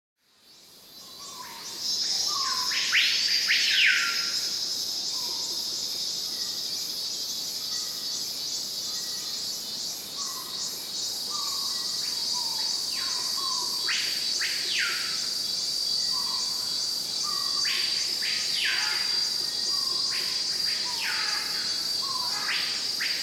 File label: ambience jungle; NCH Library